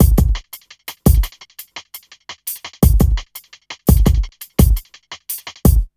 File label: BEAT, Hip Hop; USB Soundscan vol.34; Burning Grunge; AKAI; TIMBA